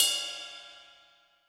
ride, Zoom MRT-3B

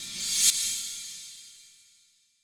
Reverse Cymbal; Sonic Foundry (Sony Creative Software); Knutrix Drum & Bass

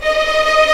D#5 TREM, VLNS TREMOLO, String; East West; The Ultimate String Collection

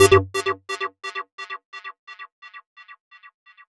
Synths, Underground UK House & Electro

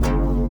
LIQUITAR; Spectrasonics; Metamorphosis

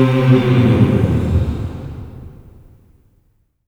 OCT FALL, voices; OCT.FALLS

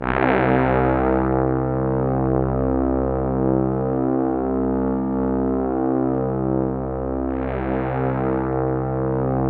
C2, Synth Line; 90 s Sample CDs; NiceBeats; SynthLine VOL-24

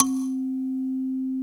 ethnic C4; ETHNICAL

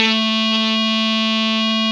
GTR Fuzz, Dirty; Club-50 - Foundations Roland

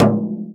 FRAME, INDIAN PERC

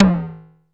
HIGH TOMS, DRUMS; Music Store Sound Service; Einstein

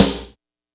kit, Yamaha Motif-ES8

